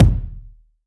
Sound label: long tail reverb bass drum one shot d key; kick